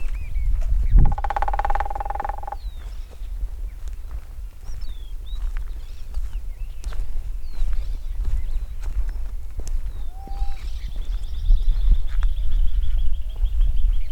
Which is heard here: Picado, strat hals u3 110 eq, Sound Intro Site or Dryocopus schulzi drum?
Dryocopus schulzi drum